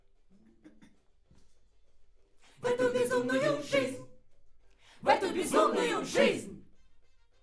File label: Backs AKG LEFT